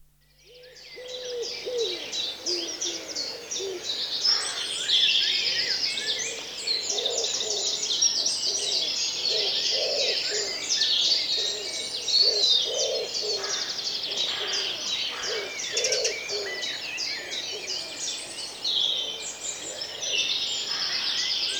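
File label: in summer in a deciduous forest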